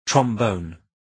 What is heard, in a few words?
trombone